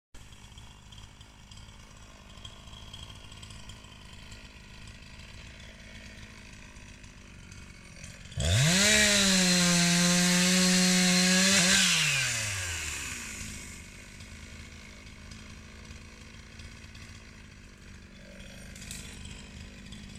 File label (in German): Motorsäge; Modulkoffer